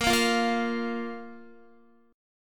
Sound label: A sharp 5th A sharp Guitar; strummed; JGuitar